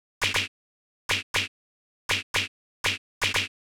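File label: Side OHH, open hi hat; hydrogen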